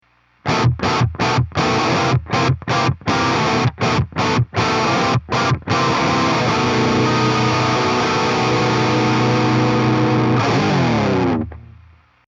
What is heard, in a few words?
LINE6 2204 mod, helix amp model; Helixシリーズのマルチエフェクター